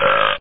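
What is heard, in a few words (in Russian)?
отрыжка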